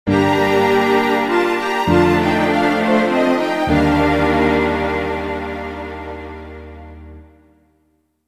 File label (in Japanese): ヴァイオリン ジングル